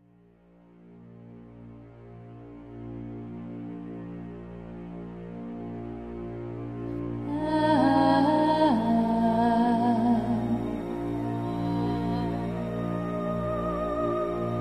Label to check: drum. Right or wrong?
Right